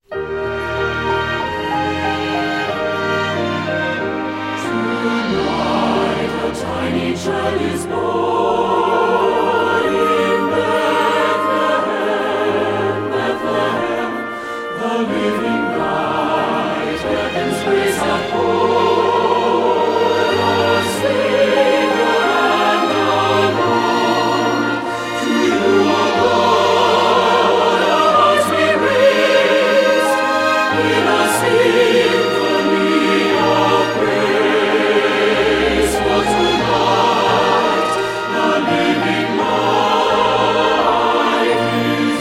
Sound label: SATB